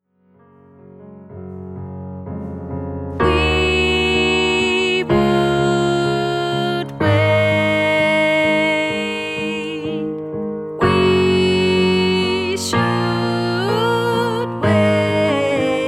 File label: wi woud weyd, women